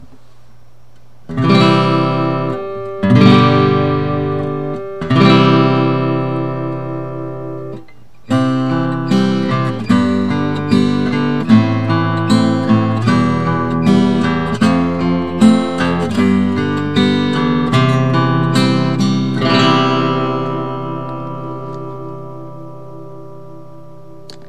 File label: mic; マイクの音; 録音（基準）